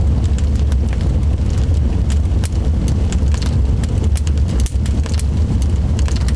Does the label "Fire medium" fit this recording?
Yes